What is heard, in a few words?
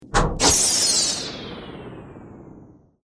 Wizard Casts Spell Of Fire; Magic Sound Effects